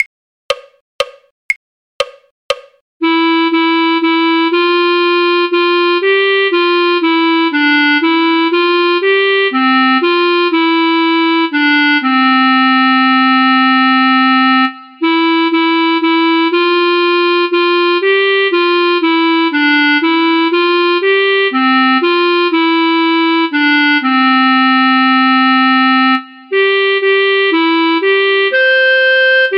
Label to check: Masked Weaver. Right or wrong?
Wrong